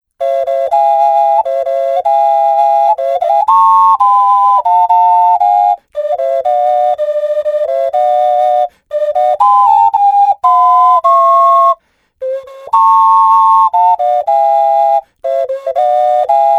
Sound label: Alto Duet Cradle Song, ocarina